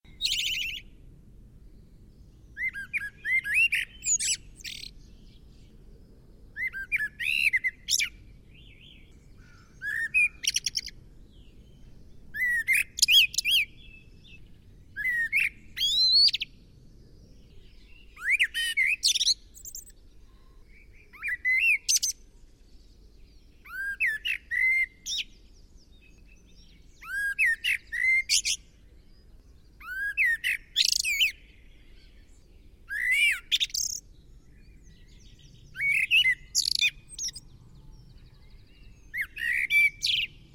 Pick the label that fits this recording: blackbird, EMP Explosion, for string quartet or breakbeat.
blackbird